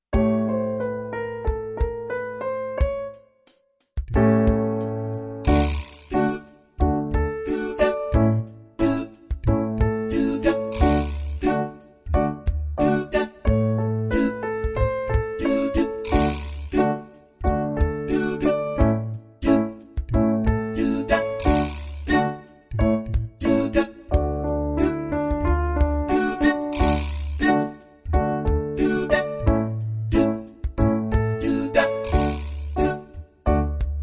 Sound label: accompaniment, violin; heypr for violin bk 1 bronze